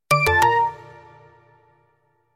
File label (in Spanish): oppo notificacion